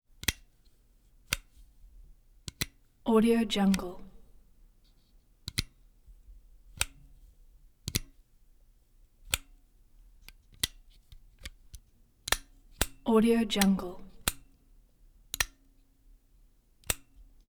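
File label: flashlight turn on and off close up metallic clicks; prosoundfx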